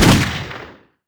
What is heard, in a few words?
zmg fire, weapons; gesource; xshemps customs